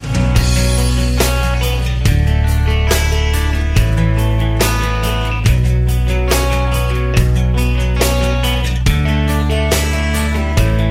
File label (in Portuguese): INSTITUCIONAL; pack de tilhas e efeitos 2.0